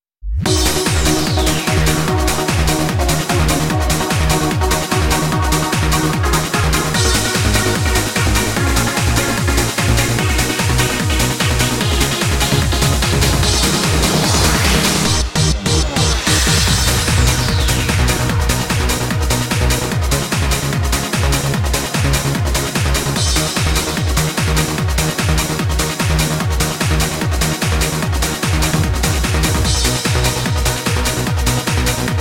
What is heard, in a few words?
karaoke; sdvx